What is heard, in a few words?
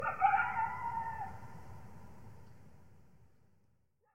coyote; Fossil Glyphs